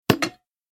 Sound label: button; jumping